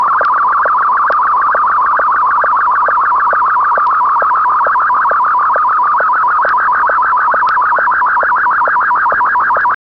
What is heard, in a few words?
SSTV Amateur Slow-Scan TV (Martin-1)